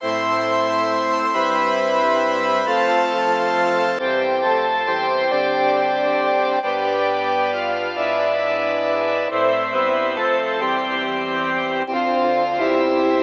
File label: logidy epsi factory cabinets modal